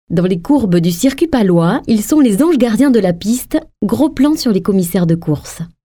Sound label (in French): Jingle Sport